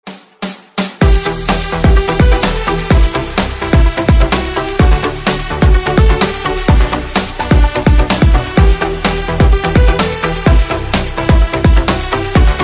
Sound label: instrumental